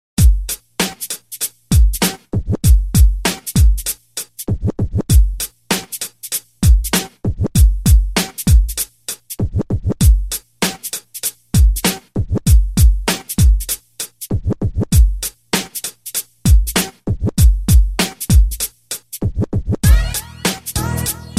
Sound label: Hip Hop